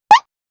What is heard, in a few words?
video game sound effect